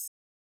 HiHat

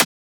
Transient; FLPRO

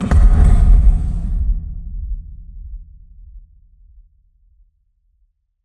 Bass Water Slap, Sub Drops & Boom FXs

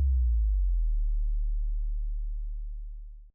SubDrop Long

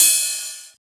Ride; FLPRO

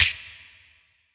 Snap

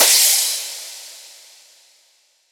Crash

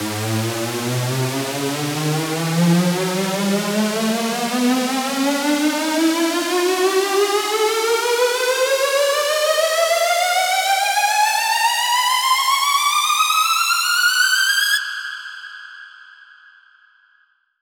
Square Ab 8 Bars (Higher)

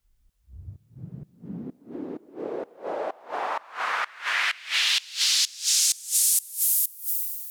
Raise SideChained

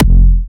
Harder F4, Kicks, Big Room Kicks

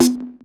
Percussion; FLPRO

